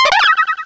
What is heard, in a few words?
cry; source of the sovereign